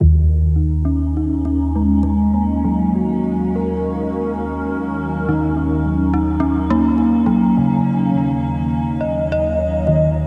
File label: Exterior Music Loop; moul